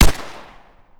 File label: gunfire; weapons; br85